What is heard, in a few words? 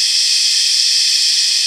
Shush; Noises, Organics; Xfer Records Serum; Serum Presets